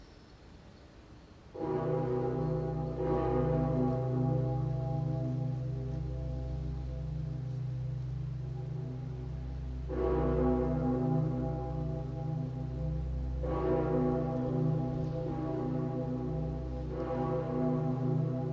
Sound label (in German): petersglocke und vollgelaeut koelner, domgelaeute; Glockenkunde; mit unterschiedlichen Geräten aufgenommen